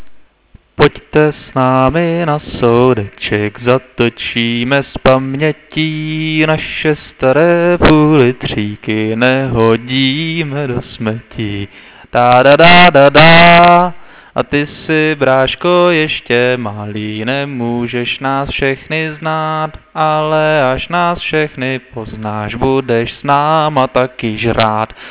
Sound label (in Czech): hymna